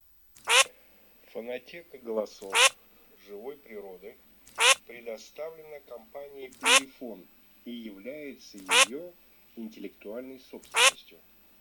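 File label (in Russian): Чирок-свистунок Alzavola, манок; plurifon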